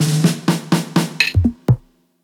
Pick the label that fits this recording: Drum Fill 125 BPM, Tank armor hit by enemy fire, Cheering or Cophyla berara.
Drum Fill 125 BPM